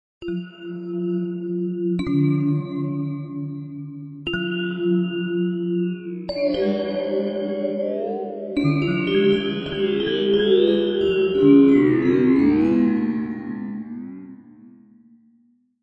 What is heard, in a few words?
Vibraphone; Peter Vibraphone Mod